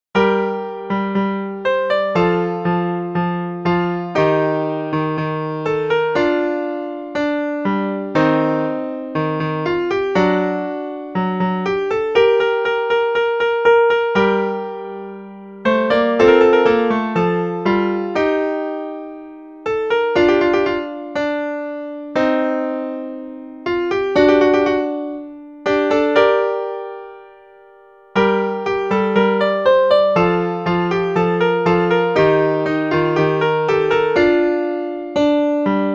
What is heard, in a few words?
Sad Days Ahead; One of my first songs, made by hand on the piano. For a sad part of the game.